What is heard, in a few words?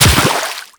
Run Water, Footsteps